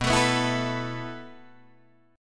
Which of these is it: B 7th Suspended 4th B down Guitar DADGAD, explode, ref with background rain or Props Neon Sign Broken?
B 7th Suspended 4th B down Guitar DADGAD